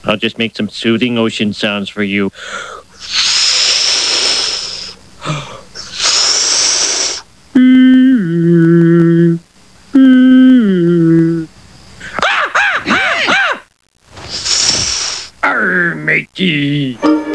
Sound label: ocean; superguppy